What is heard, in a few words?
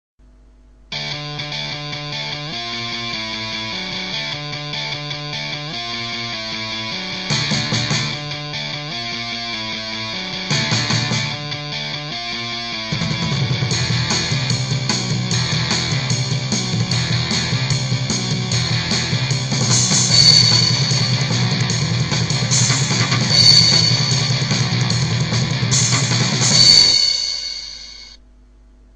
instrumental